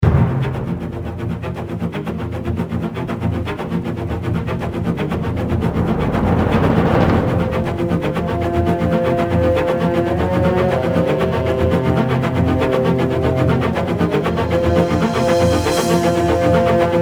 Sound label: slow, music; Soldier Of Fortune II Double Helix; XBOX; prague